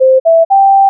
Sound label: work done; pomodoro app